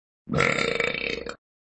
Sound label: BURP!